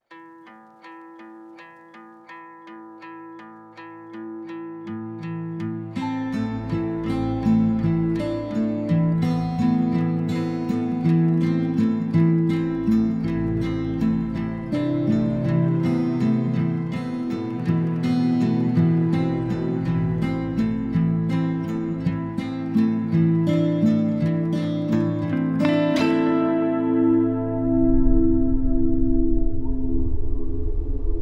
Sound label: PROBE Frequences CINEMA